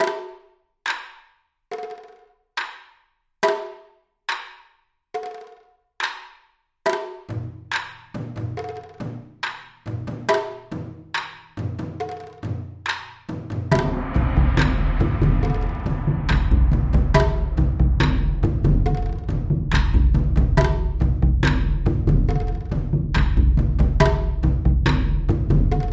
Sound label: Double Japanese Drum